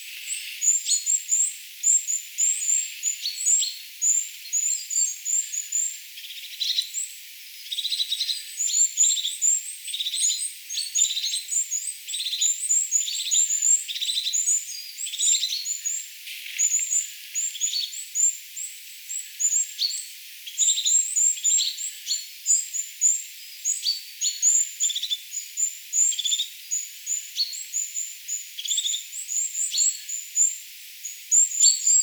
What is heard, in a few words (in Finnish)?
nuorten hippiaisten huomioaantelya